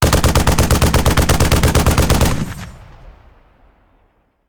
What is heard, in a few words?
Machine Gun; Sound Ideas; Guns